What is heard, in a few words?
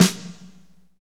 SNR, Snares, Roland